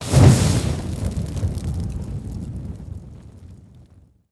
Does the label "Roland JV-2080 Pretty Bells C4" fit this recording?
No